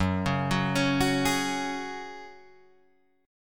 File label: Gb Suspended 2nd, Guitar Drop D